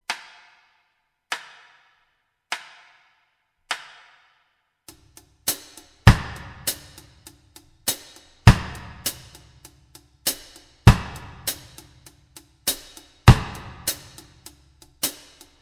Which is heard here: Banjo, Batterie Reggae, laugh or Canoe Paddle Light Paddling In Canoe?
Batterie Reggae